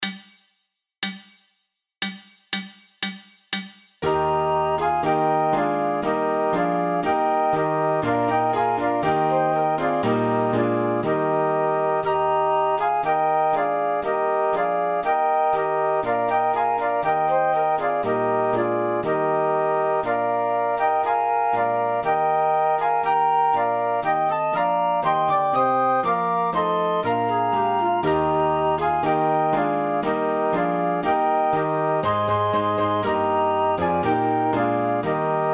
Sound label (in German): Klavier Floete; 120 bpm; deck the hall; weihnachten